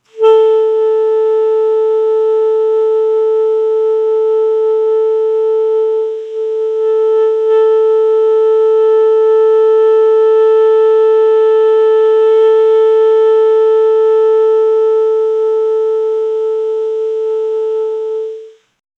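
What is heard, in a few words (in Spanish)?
sonidos lejanos de saxofón, jazz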